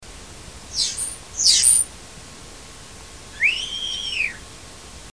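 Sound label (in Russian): звуки животных звуки скворца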